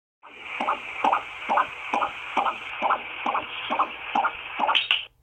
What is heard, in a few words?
Baby heartbeat